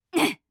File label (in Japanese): っ…！